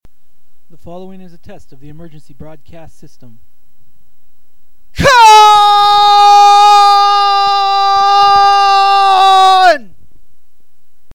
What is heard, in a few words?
scream